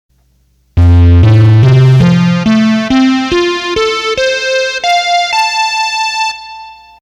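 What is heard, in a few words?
Synth 2, Roland accordion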